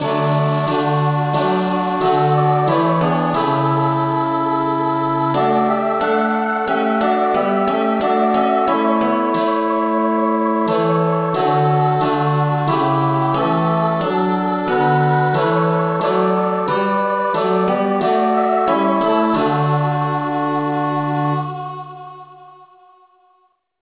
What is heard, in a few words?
saintthomas rev choir key d